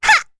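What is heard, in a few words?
Attack, Korean; heroes; Rehartna; kings raid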